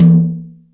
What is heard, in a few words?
Brsh Rk Hi, Acoustic Tom; Yamaha DTXpress